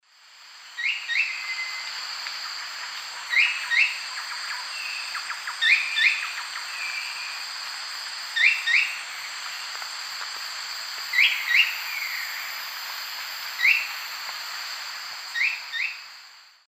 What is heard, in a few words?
Tyranneutes stolzmanni, call; S. America; Eastern Lowlands